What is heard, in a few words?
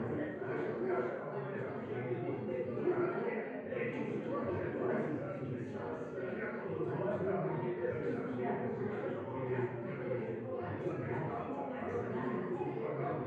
wedding yard crowd; PS4; Black Book